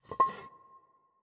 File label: Jar